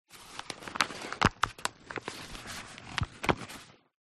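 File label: food flour bag paper handling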